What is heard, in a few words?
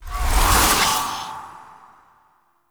Blood Lust Cast; Powers; Fey; Founders Champion